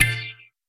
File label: Navigation Sounds